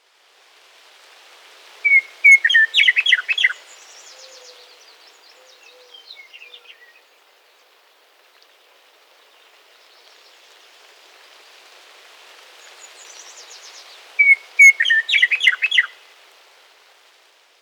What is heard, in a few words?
Western Meadowlark, Sturnella neglecta; blackbirds orioles